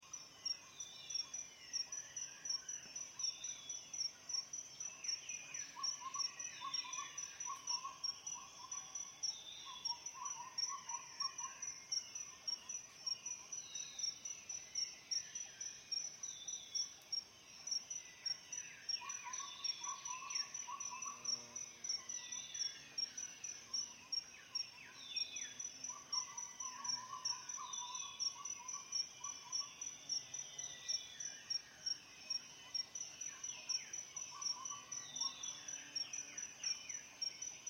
zvuki utra

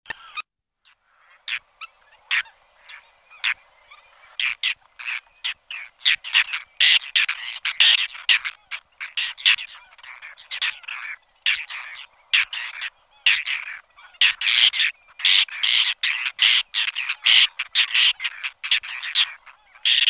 beloschekaya krachka golos

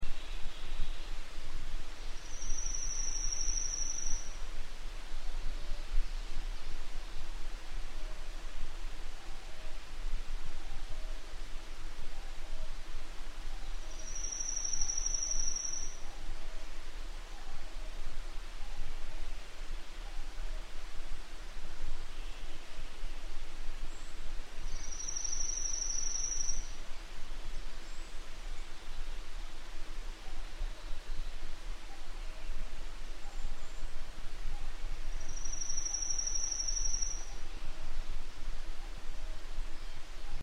blednonogaya penochka golos

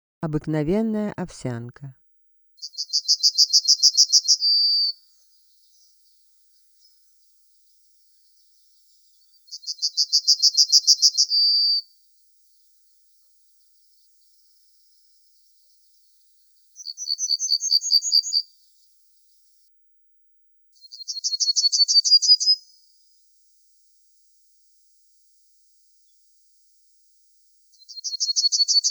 obyknovennaya ovsyanka golos

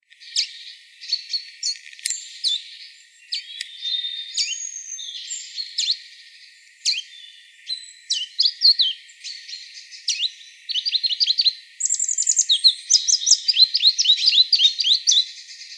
korolkovaya penochka golos

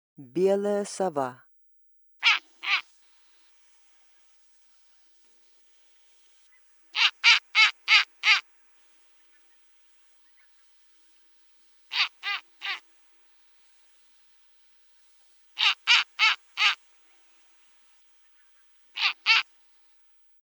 belaya sova golos

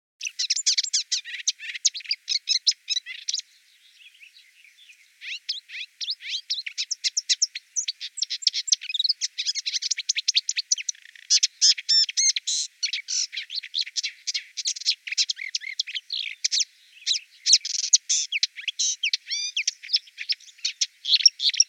bolotnaya kamyshevka golos

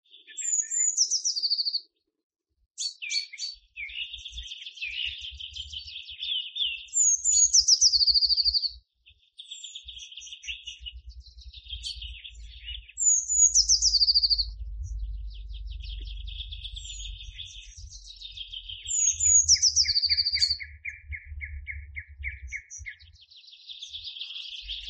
obyknovennaya lazorevka golos

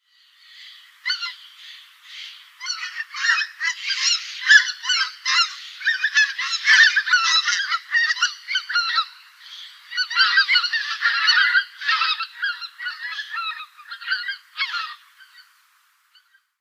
belolobyy gus golos

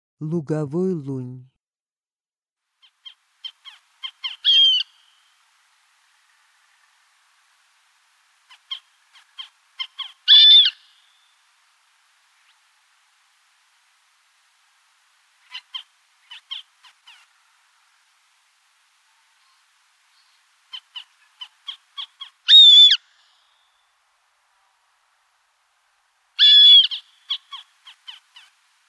lugovoy lun golos

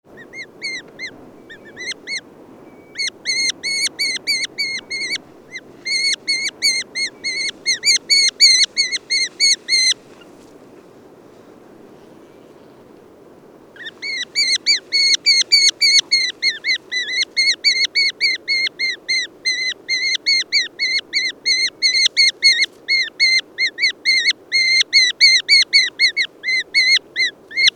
hrustan golos

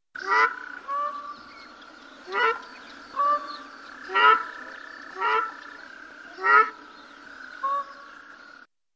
ogar golos

